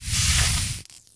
shock rifle sphere touch

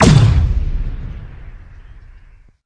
blast rifle

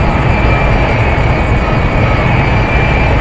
redeemer run by, weapons

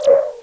portal jump shot

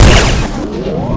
railgun fire

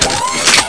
flak cannon weapon pickup

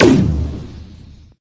main plasma

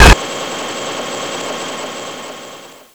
plasma gun

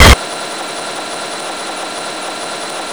plasma gun

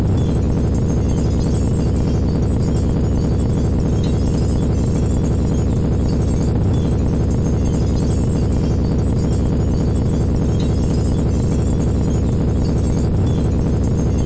freezer run, weapons

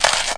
freezer impact, weapons

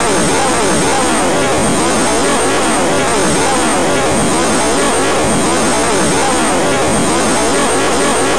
freezer run, weapons

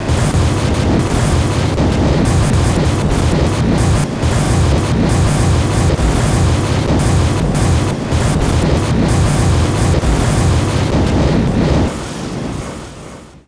m41 fire, weapons

